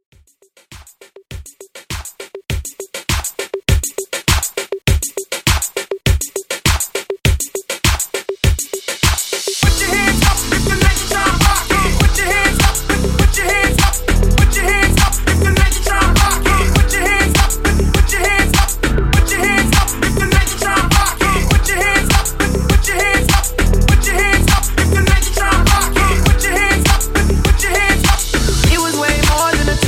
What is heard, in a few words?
Top40 Hype 103 Bpm; Redrum